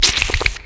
spider spit roar

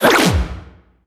hole dive; littlehorn

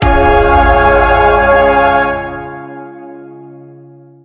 super holy, feedback

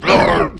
monster grunt

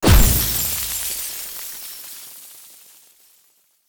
coin destroy; greed; coin destruction

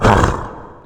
war bomb toss

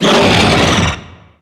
roar; carrion queen

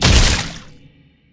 death burst large

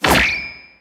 friendly ball raise

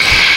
boss lite hiss

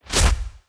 invincible on; ragmega

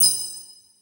soul pickup

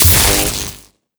red lightning burst

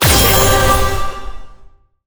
angel blast

